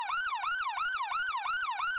Tone Alert; APX

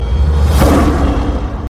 Lion Roar; Singham

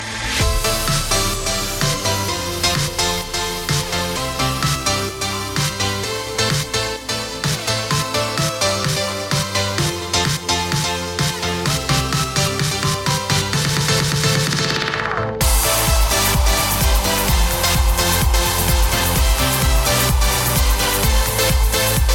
Euphoric Sound

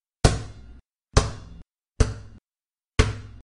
Basketball Bounce

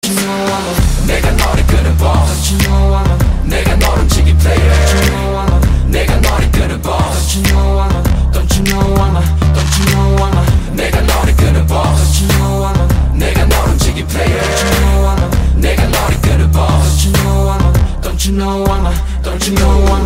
Chorus

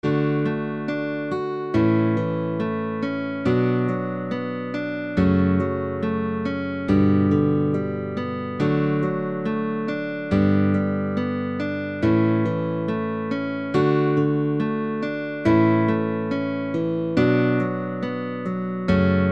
Canon

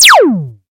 Laser Message tone

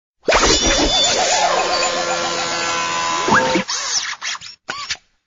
Robot Drill

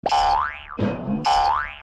Boing

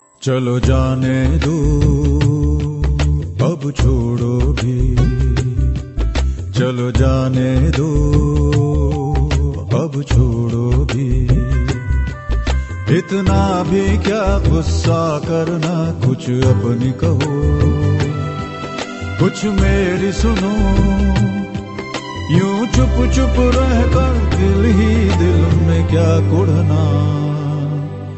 Amitabh Singing

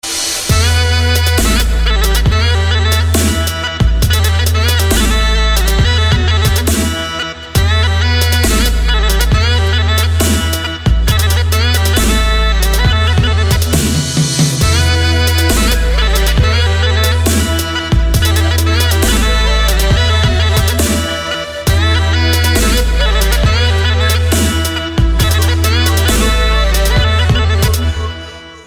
Bg Pipe Dubstep

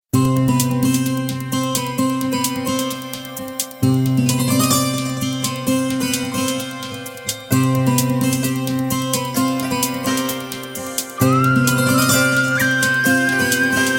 Sitar

